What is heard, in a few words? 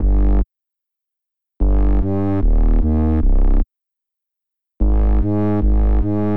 Hip Hop Rap Bass